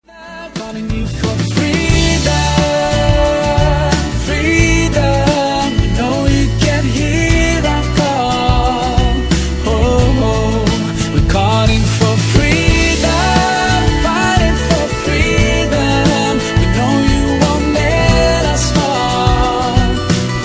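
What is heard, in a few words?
english islamic ringtone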